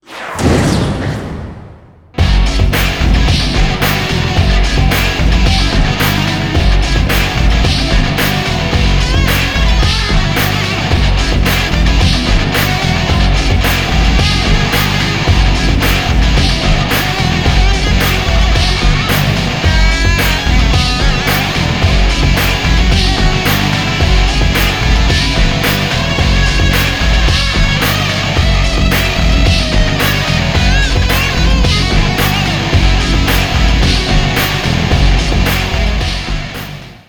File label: win; Continent of the Ninth Seal; BEXTER